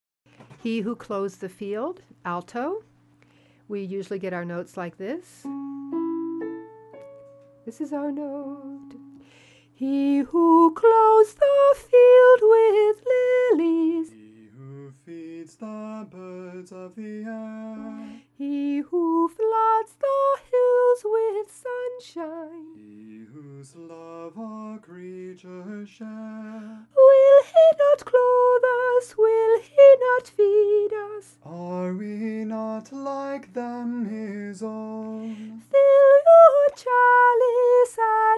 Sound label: alto alone; alto oratorio; He Who Clothes the Field